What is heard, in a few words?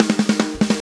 140 Groovy Fast Drums; Pop Rock